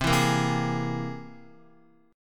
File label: C Augmented 7th, Guitar; strummed; JGuitar